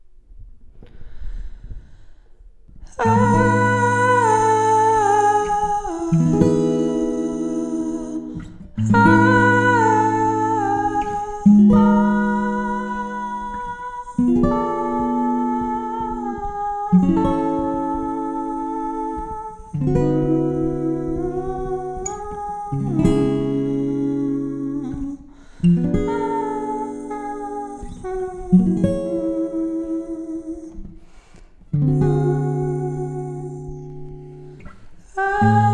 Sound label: Relaxing Music; for stressful day for Chandler